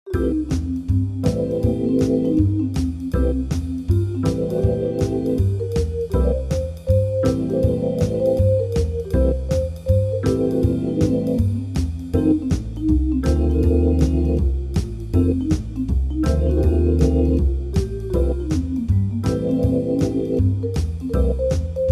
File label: MIDI blues